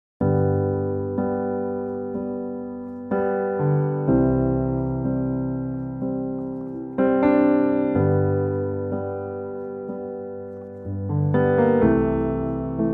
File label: piano